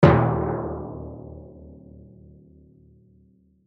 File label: Timpani Hit Hard Bong Low; Hits; Musical; Blastwave